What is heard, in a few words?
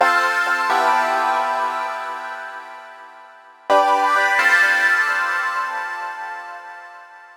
130 D Synth Light Accents; Zero-G; Future Wave